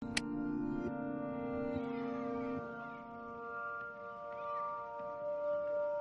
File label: instrument; before your eyes